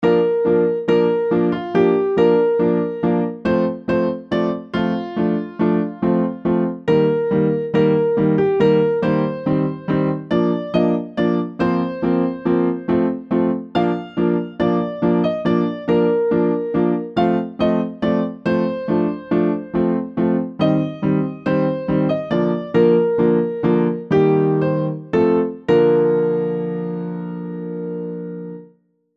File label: Street Beat